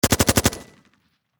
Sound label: Warfare Machine Gun 5.56 Caliber Heckler Koch Hk G36 Long Burst Distant Perspective